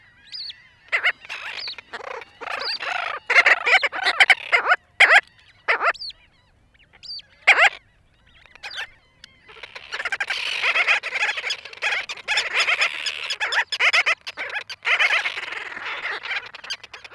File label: Bird Call Gull Billed Tern Colony; hrbt update tale two islands